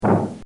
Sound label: Cartoon Sound Effects Bong; Cartoon Sound Design; Bobby Cole